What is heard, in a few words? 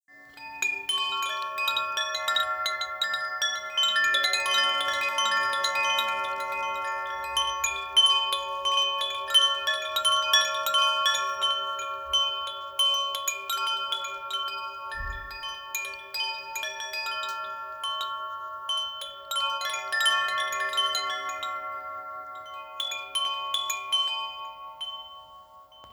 7 cakra chime; cakrova zvonkohra korunni 7 cakra